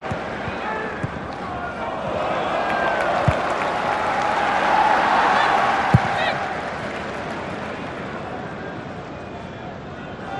sport soccer match stadium crowd chant cheer

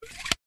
cartoon slide whistle mechanism slide no whistle; zapsplat

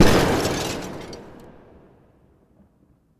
explosion large glass debris; sound effects one

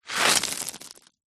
foley stones kick mound roll down

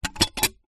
foley glass thin small sheet pane down on surface

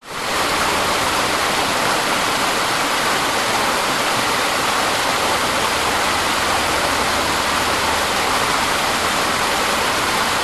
nature waterfall med forest 10m distance

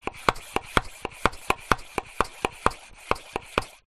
household hand pump pumping

